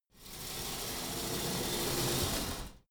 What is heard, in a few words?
pullup machine cable release; sport freizeit; fitnessstudio